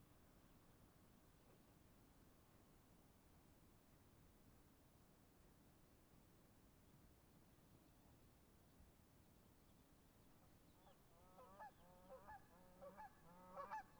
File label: Geese Steens Dawn Goose Flyby Pair; Oregon